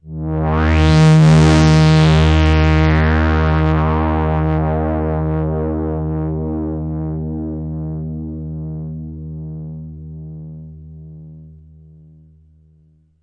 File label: D sharp 2, Sweep Synth